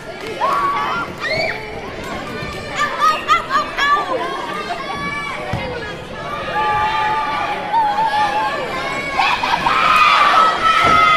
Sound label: Small Crowd